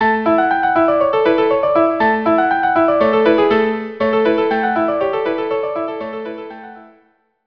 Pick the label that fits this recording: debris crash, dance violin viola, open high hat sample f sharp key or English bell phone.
dance violin viola